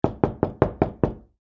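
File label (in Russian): стук в дверь костяшками пальцев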